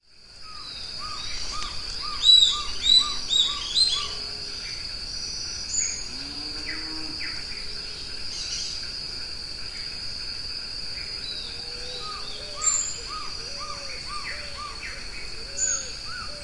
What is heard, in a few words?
Trogon chrysochloros, Surucuá Amarillo; Se escuchan macho y hembra